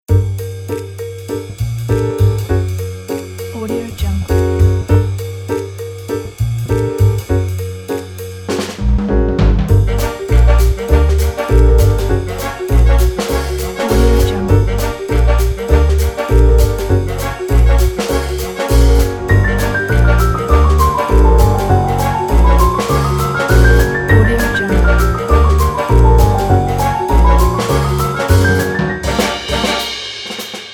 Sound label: criminal suspicious jazz; animapiano